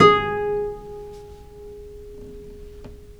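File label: VIOLE PIZZICATO G#3, Historical Instruments; USB Soundscan vol.23; AKAI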